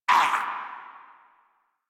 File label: Pain, Dialog, Barks, Battle; en; Warframe; Grineer